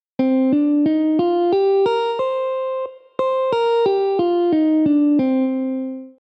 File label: Minor Hexatonic; scales